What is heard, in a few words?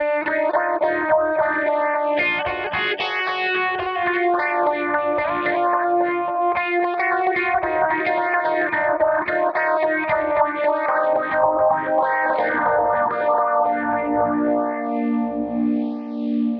Lead, Synth, Leads